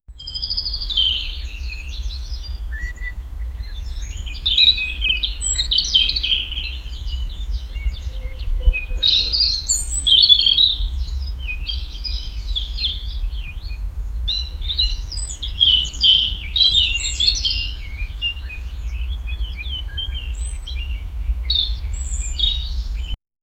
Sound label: birds chirping constantly